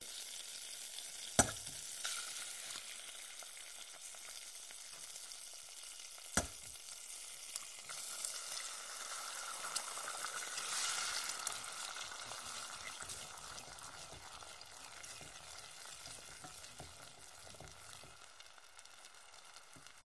household kitchen cooking eggs crack scramble stir sizzle pan